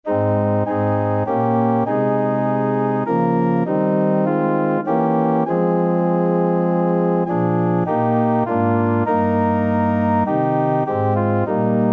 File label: where cross, organ